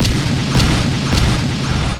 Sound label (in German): RAKETE; Best Service; Advanced Mega Effects